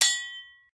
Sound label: Sickle Hit; smite; izanami